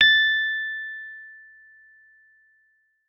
Rhodes fortissimo a6; Session Instruments

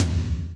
TOM TOM, Roland

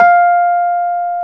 PNO, Electrics, Rhodes

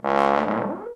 TROM DOIKS

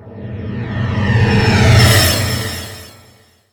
fx reverse; Ueberschall; Techno Trance Essentials

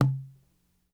Cahon Mute, World Percussion One Shots; Sony CS

